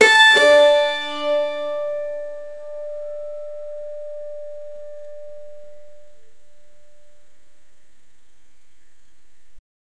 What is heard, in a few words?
guzheng strings played on